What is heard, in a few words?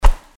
Fighting Swing Sound; FXHome